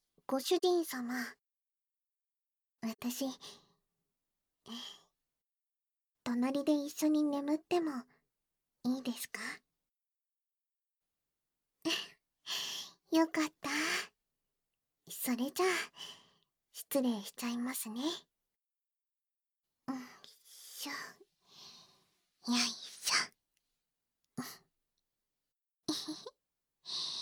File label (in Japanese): 添い寝（バイノーラルマイク 頭なでなで効果音 大きめ）; バイノーラルマイク 効果音あり